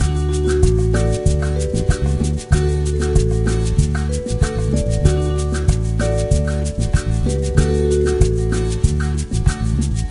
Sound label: Cruise Music; Diner Dash: Flo on the Go; NDS